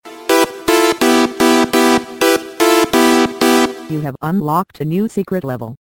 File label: Musical Sms